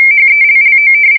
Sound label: rtty 45